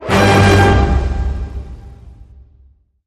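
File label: Hit Orchestra Glam Triple G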